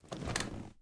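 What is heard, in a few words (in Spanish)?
LEVANTARSE BANCO MADERA, getting up from wooden bench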